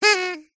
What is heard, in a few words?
foley party whistle horn blow x1; zapsplat